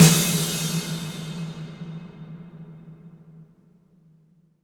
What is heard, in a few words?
IMP DRM & CRSH, Cinematic Impact